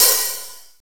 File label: C OPEN, HATS; Zero-G; Funky Elements